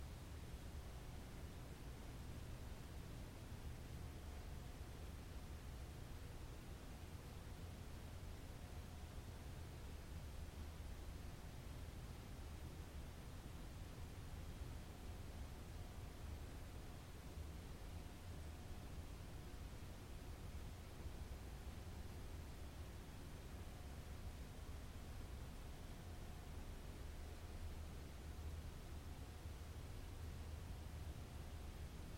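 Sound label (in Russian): спокойный отдалённый глухой звук работы производства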